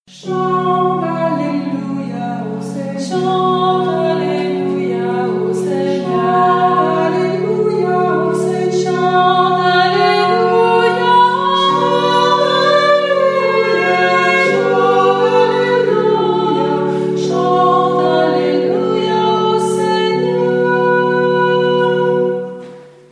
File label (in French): Tutti; Chante Alleluia au Seigneur